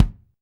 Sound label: BD, DR BD; Best Service Gigapack 2